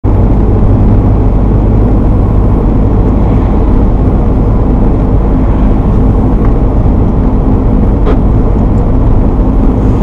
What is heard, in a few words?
car sounds